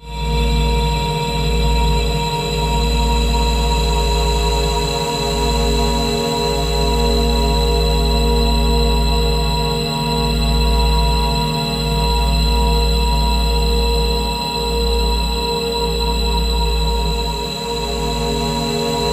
Ominous Drones; USB Soundscan vol.48; AKAI

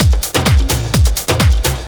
Latin Loop 128 BPM; Best Service; House Definition VOL-1

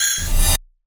Fx Vox; Equipped Music; Loungin House

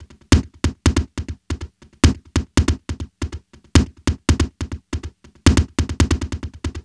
NRG Percussion, Trance Drums

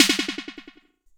Snare Delay, TR-606 Bent Samples; Sonic Foundry (Sony Creative Software); The Electronic Music Manuscript Collection; Battery 3 Sample Kits

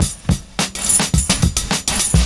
70s Breakbeats; USB Soundscan vol.46; AKAI

